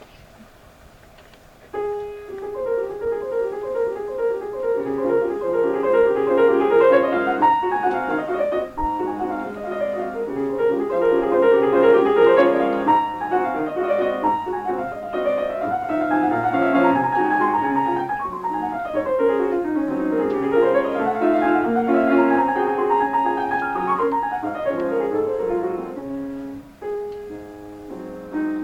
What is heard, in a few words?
recital Minute Waltz